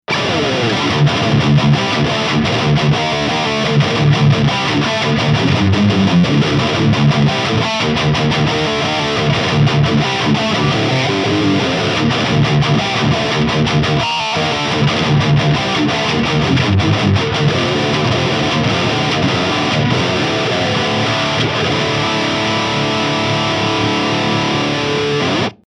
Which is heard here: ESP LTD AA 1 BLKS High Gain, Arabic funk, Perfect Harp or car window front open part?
ESP LTD AA 1 BLKS High Gain